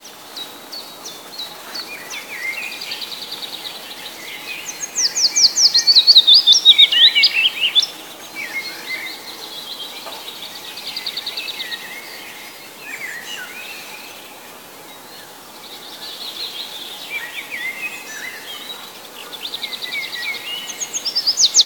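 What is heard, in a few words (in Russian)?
utrennyaya trel drevesnitsy v roshche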